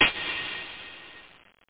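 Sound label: natural hi-hat open; OctaMED SoundStudio V1; jazzies samples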